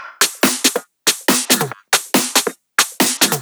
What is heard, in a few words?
drum Loop 140BPM Full